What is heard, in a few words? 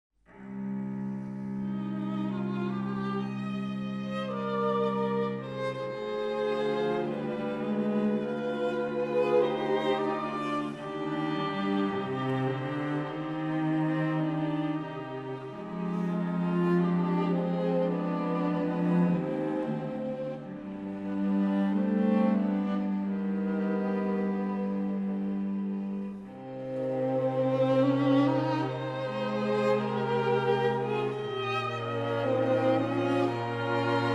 Canon in C Minor; Ciurlionis